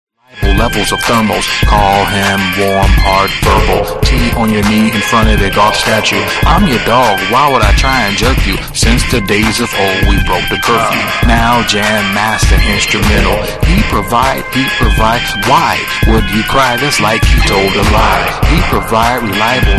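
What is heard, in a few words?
instrumental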